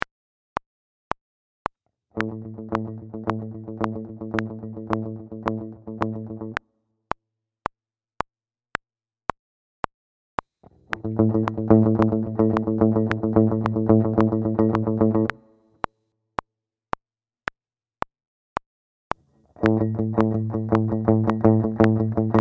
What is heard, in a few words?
Metal Rhythm Guitar